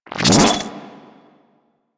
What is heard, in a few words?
Freeze Ray Shot Stop; Warframe; Weapons; Corpus